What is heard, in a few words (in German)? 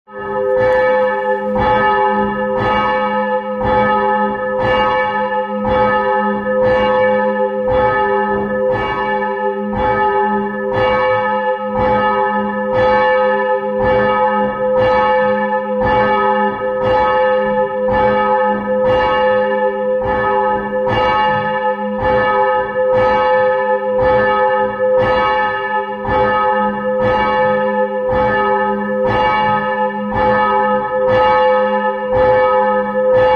Glocke Nebel